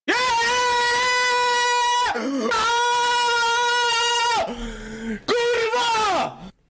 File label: jeee